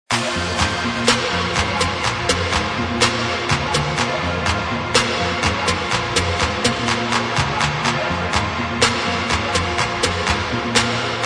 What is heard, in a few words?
Hype